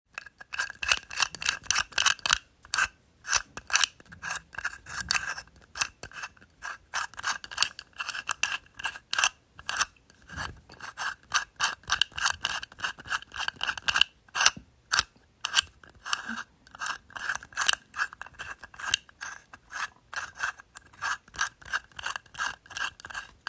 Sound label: Combination Lock